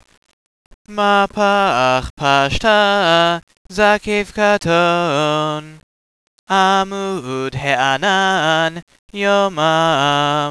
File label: mahpach pashta zaqef qaton